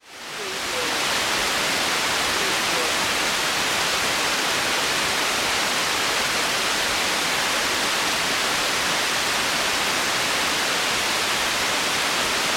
Weather Rain On Lake

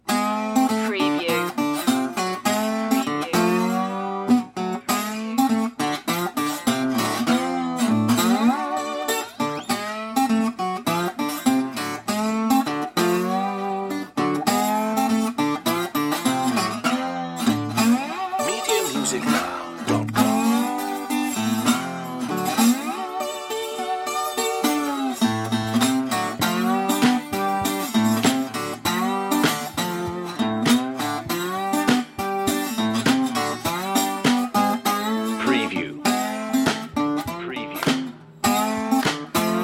no harmonica drones

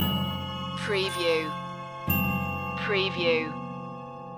orchestral suspense loop

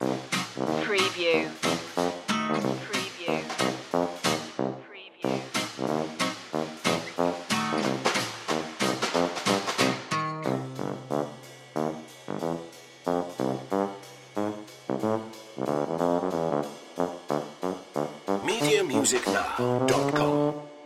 dewdropper loop

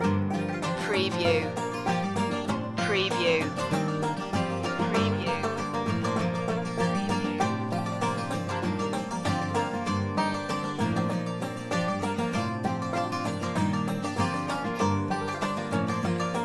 folk loop

